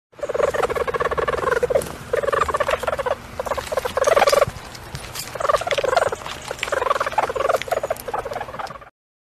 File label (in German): hermelin; haus und nutztiere erkennen